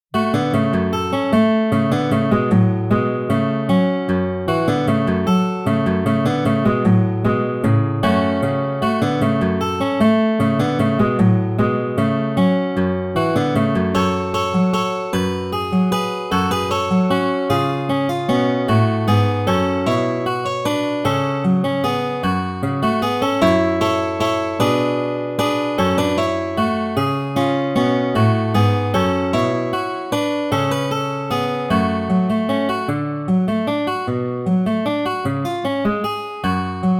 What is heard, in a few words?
Acoustic Guitar